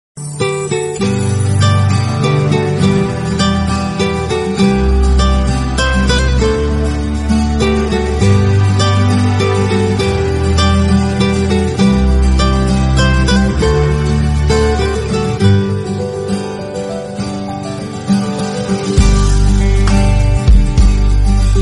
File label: Romantic Movie Bgm, ringtone